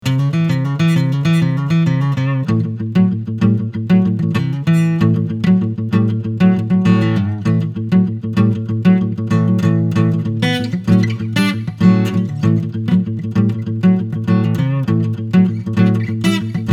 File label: Framus Legacy Series FD 14 SV Picking